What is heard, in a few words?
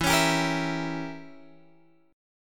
E Diminished 7th E, Guitar Open F; Edim7; strummed